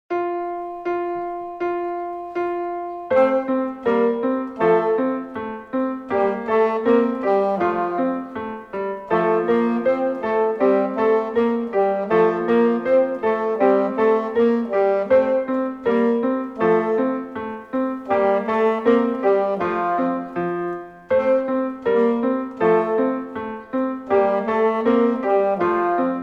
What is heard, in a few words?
Alto Sax; Suzuki Volume 1